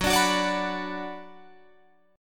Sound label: Gb Suspended 4th Sharp 5th, Guitar, Open F; strummed